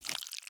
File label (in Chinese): 蜜脾上蜡声